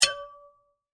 Multimedia Inquiry, Technology, Multimedia, Inquiry, Element; Alerts